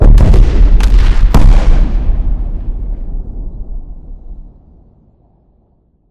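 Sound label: explosion distant kaboom; corporate; Titanfall 2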